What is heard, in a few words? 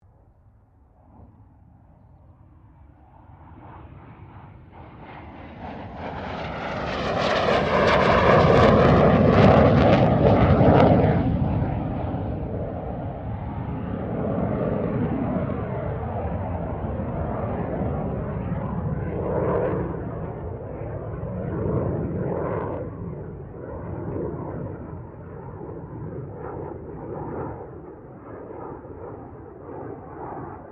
AIRCRAFT CA-27 SABRE PASS; CA-27/F-86 Sabre; Airplanes; Vehicles